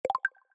notification; OpenHands

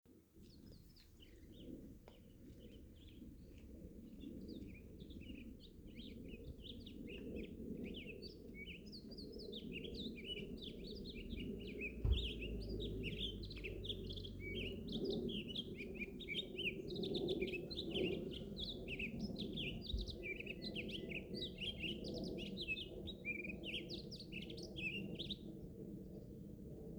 Hairy Woodpecker, Dryobates villosus; Aves; iSoundNat; inaturalist

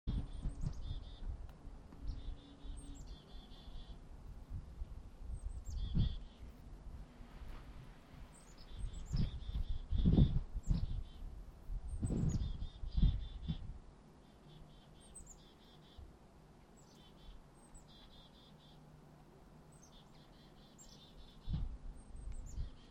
Eurasian Blackbird, Turdus merula; Aves; iSoundNat; inaturalist

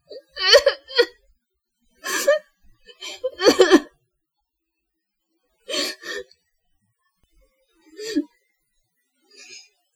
crying

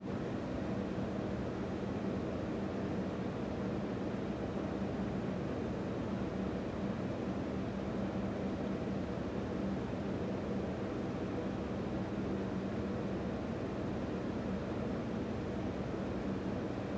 backnoise; Ten2Zero

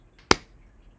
clap

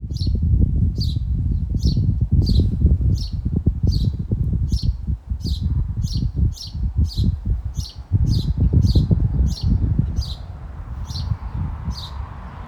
Caatinga Cacholote, Pseudoseisura cristata; Casaca-de-couro; Aves; iSoundNat; inaturalist

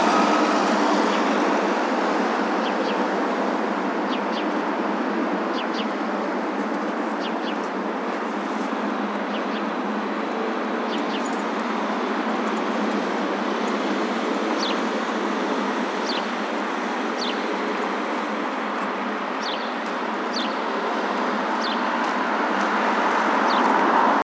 White-browed Laughingthrush, Pterorhinus sannio; 白颊噪鹛; Aves; iSoundNat

